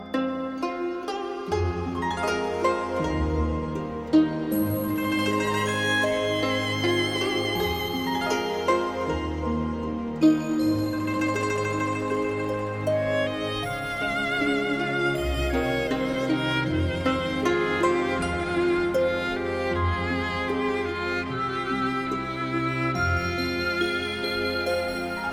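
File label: kitajskaa garmonia na guslah guzheng, Chinese harmony on guzheng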